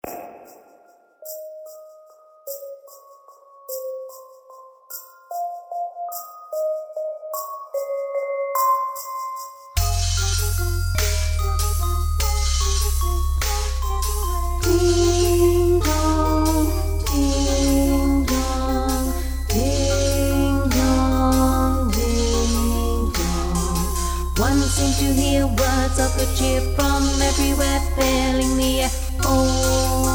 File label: Carol Of The Bells Bass; rock choir resources